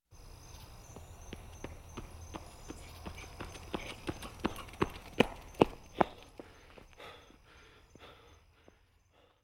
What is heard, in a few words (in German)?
Sport Jogger Naehert Sich